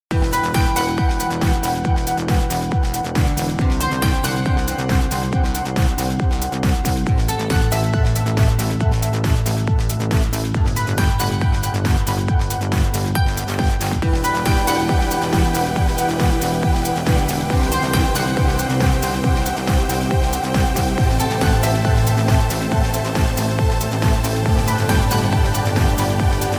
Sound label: Trance In Motion